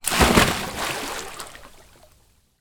nature water splash child jump into pool straight jump; zapsplat